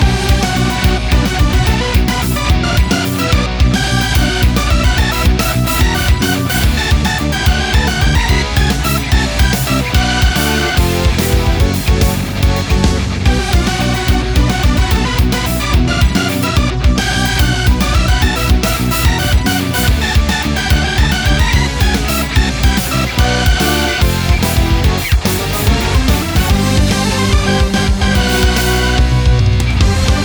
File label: Battle